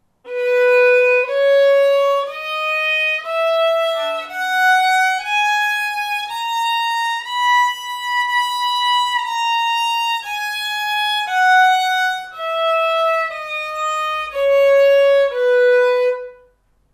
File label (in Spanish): si escala, violin; mi primer libro de escalas